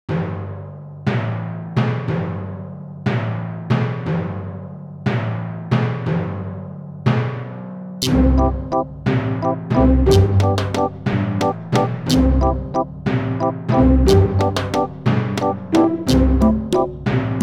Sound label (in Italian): elle elefantina rallentata; l'elefantina elle